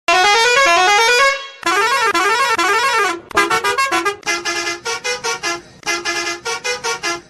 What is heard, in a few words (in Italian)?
Trombe autobus